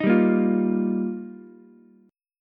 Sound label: G Minor 7th Sharp 5th, Ukulele; Gm7#5; strummed; JGuitar